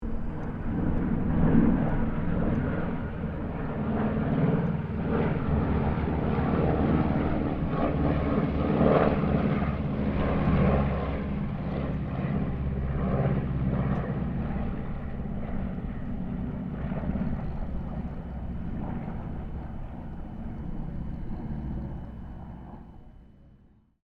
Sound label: C195 squadron 2 pass, Airplane; Mixed aircraft squadrons; Airplanes (Sound Effects:Vehicles)